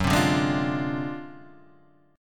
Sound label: D 7th Sharp 9th Flat 5th Gb, chord